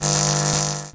lightning; Guns n Zombies

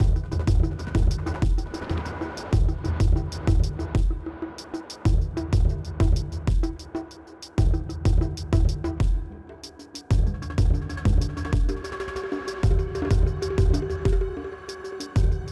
Music Boss (Boss Fight Intensity=Medium); Music Boss Hideout5; BossFight Phase=Phase1; Phase1 Normal; Sifu

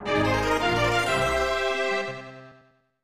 Important Item; Alcahest

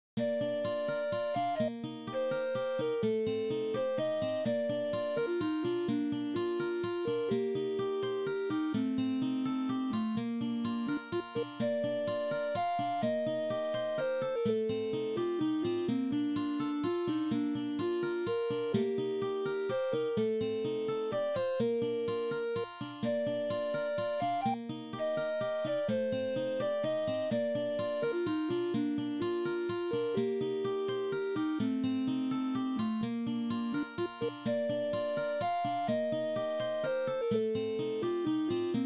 Roland SC-55; SimCity 2000

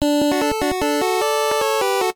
Game Clear; puss n boots pero s great adventure nes

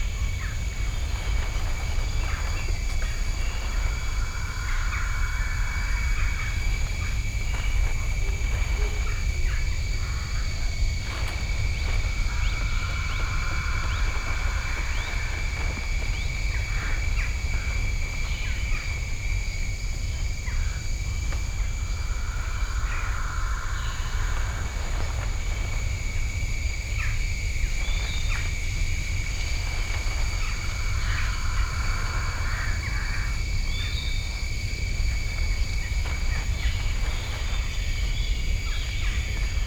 Ambient

